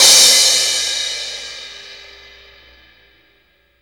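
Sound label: cymbal, disco; Best Service ProSamples vol.48 - Disco Fever; AKAI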